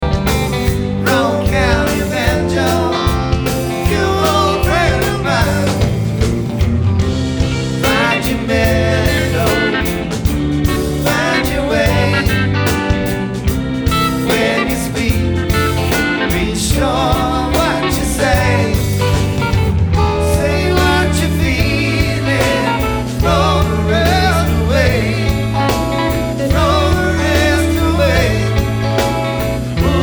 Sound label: Banjo